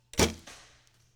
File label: archery foley release and impact